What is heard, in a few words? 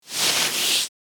Nylon rustle movement